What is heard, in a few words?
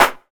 hand clap A key; percussion; single hits one shots